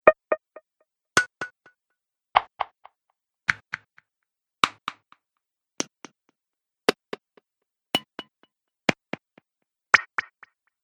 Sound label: Clicks With Delay